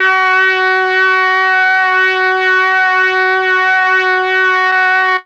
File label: MEL BRASS; ILIO; Propeller Island; Legendary M400